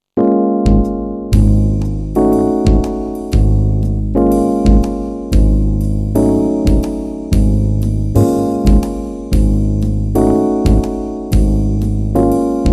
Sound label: swing